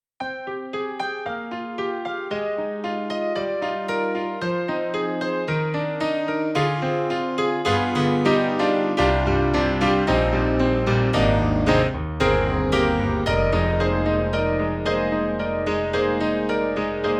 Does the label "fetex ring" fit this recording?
No